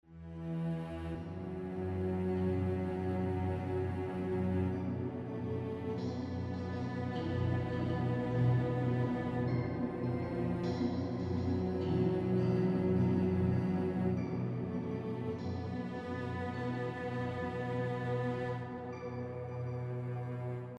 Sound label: Dungeon Loop; Avatar The Path of Zuko